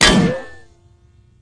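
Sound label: ricochet metal, weapons; cstrike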